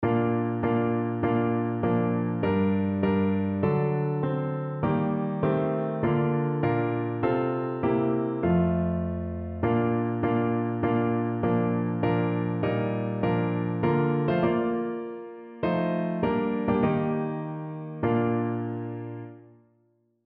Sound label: man of sorrows, piano